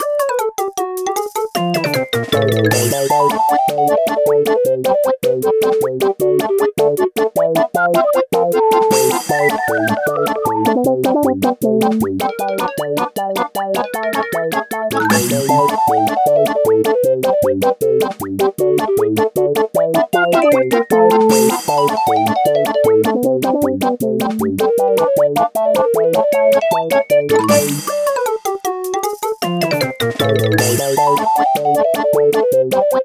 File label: Bonus; Super Mario Bros RMX 1; Mari0